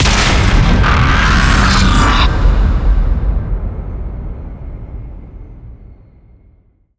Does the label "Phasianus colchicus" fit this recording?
No